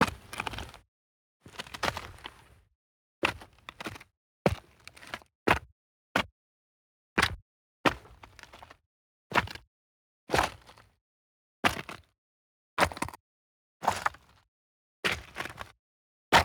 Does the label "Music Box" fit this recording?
No